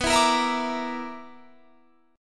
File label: B-Minor Major 7th Double Flat 5th B down